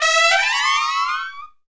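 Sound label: brass trumpet; Roland L-CDX-03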